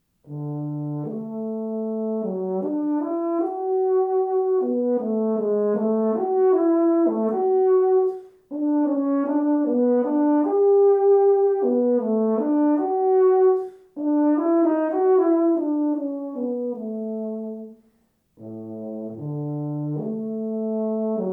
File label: Euph Lyrical